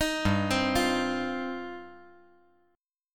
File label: G 7th Suspended 4th Sharp 5th